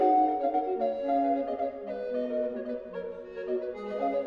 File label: Medieval Loop F#5